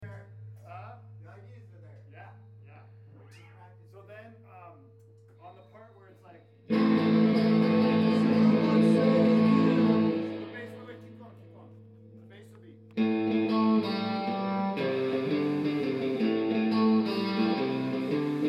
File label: bassline